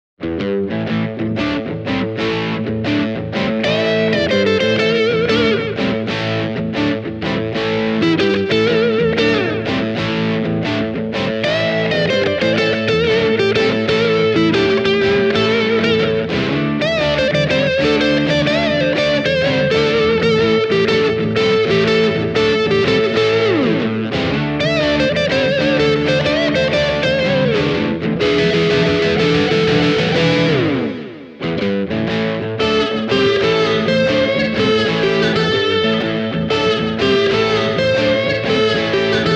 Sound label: dual tone style guitars only; rautia guitars